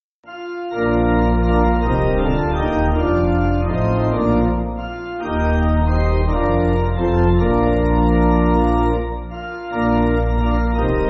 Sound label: Pipe, church music